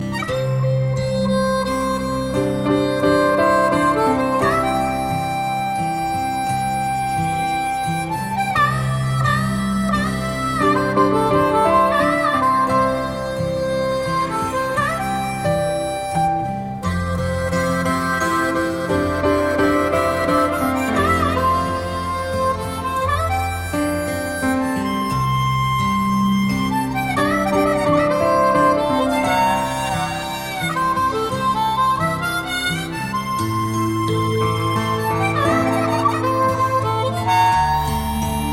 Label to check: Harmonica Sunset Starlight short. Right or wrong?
Right